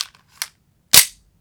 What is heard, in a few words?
LOAD, gun shots; AKAI S6000 CD-ROM - Volume 6